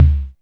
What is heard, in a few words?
BIT TOM L; Back In Time Records; Big Bang